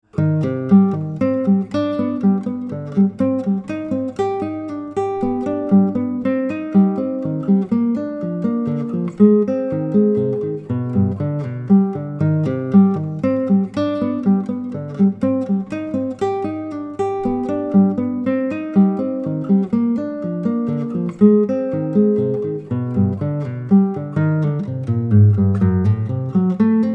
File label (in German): triad study, Dreiklangs-Etüde, guitar; Akustische Gitarre/Klassische Gitarre: Solo; „Triad Study #3“